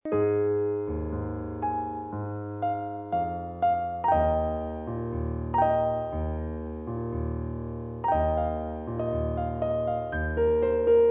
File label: Piano